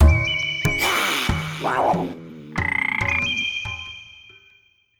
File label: basic Mood Bosque